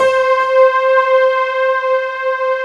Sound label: STACKED, STACK PNO